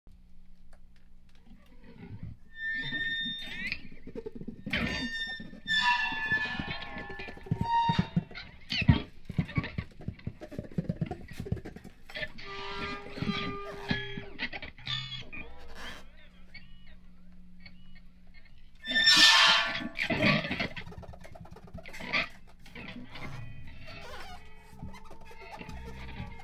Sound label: barn owl